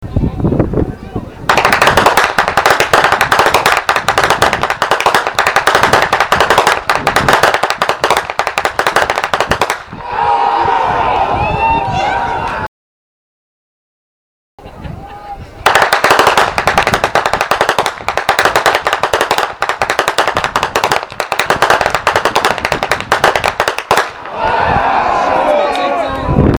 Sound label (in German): schnalzen; jugend; feldkirchen